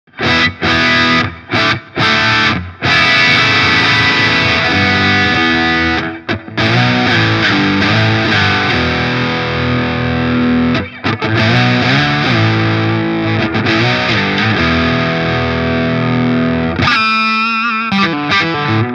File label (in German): Harley Benton HP 42 Humbucker verzerrt